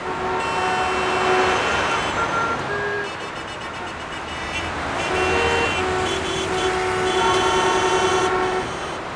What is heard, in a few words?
jam lp, disasters; simcity3000